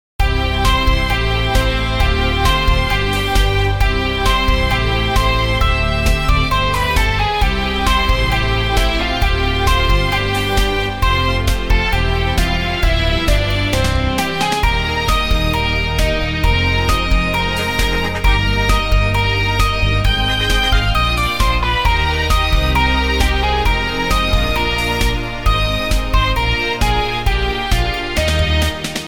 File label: GuitarMusic